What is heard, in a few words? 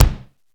bass, AKAI XE-8; Greytsounds